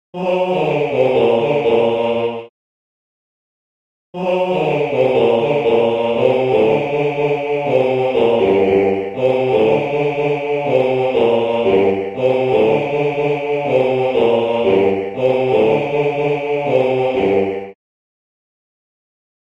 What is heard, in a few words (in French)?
baryton